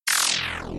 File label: Swipe